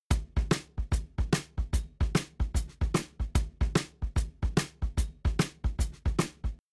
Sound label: Drums, shuffle drums; WDR2